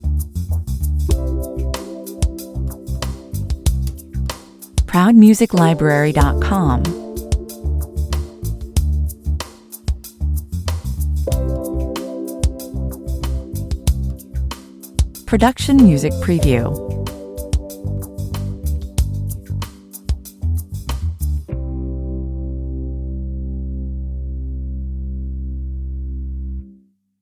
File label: on hold 94bpm